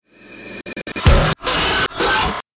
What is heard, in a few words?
bloody nightmare hard stutter impacts; soundmorph